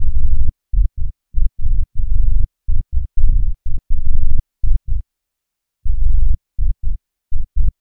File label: tech house bass F#m 123; instruments; loops